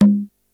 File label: conga, Yamaha RY-8